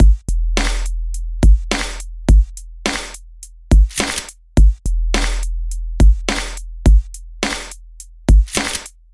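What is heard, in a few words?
Hip Hop with Sub Kick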